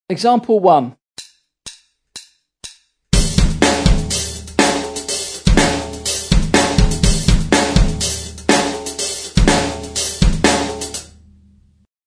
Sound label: drum set